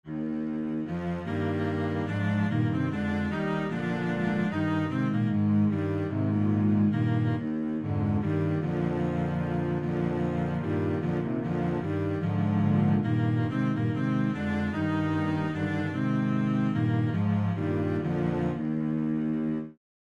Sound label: minuet, string bass